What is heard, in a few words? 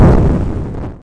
jump; bzflag